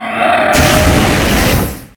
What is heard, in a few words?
Dragon Shoot